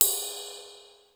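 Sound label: flange ride, cym; sr16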